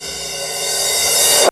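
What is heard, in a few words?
Boss DR-202 percussion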